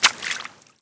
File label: warrior walk water